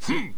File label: jump; player